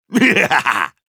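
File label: Soldier laugh evil, russian; Злой смех 3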